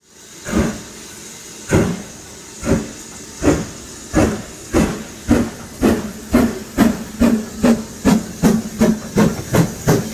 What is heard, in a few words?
BR 9F; simutrans; Pak128.Britain-Ex